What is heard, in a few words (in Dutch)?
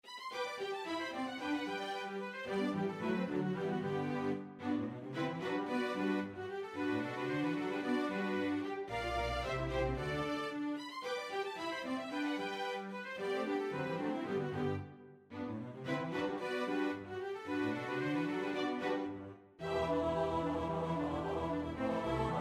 tenor; Poulenc (Francis); Gloria